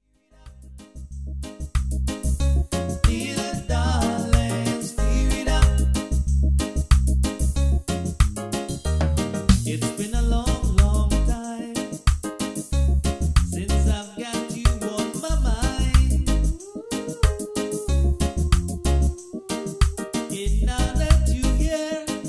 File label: Steel Drum